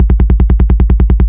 loop maxim bass, guns tanks

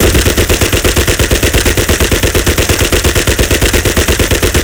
Browning 303 RH Outer; Weapons; DCS

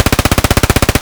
mp7 outdoor far loop; Escape from Tarkov

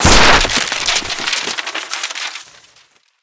explosion mailbox paper; Explosions

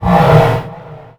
biomech howl

